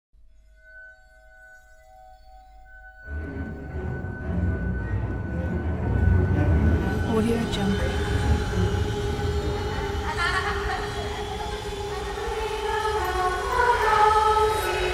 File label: ring around the rosie haunted ident